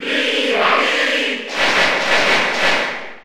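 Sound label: Cheer Spanish, crowd; Link & Toon Link; SSB4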